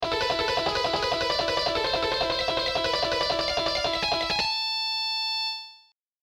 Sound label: Shredding Exercise